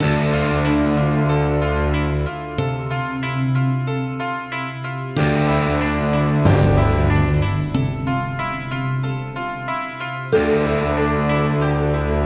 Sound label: Suspense BGM